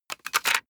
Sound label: plastic device handling rattle